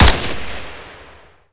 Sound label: fire, m16; garrysmod